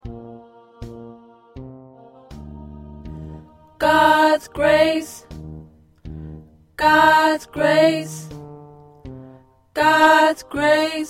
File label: 1st Tenor